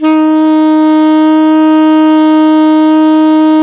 ALTO PP D#3, SAX; Greytsounds - Philip Wolfe David Crozier's Saxophones

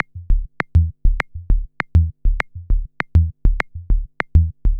perc 100 bass n click, Percussive Loops, 100 bpm; Sample Magic - Transmission-X

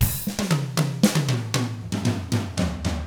Drums, R N B 6-8; ILIO; Double Platinum Drums 1

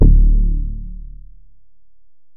Bell, Alesis DM-PRO

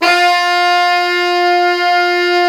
BRS, Pop Section; Roland L-CD702

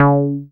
Bass SH-101

